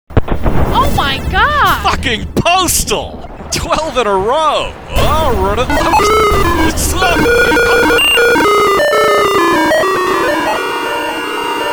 hurricane; postal

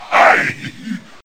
alert; bioclone

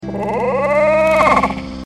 ooooo; freak fortress 2; king harkinian

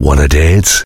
heavy meleeing; tf; duke

